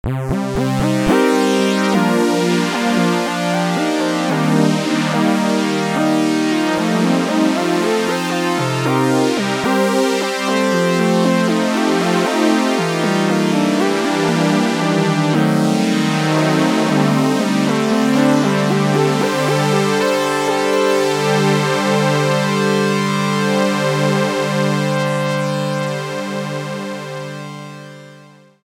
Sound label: OP-X Softsweep